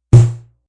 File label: Bass Button; Rollover or button sound perfect for web pages, flash animation, and other web applications.; Bass Button Sound Effects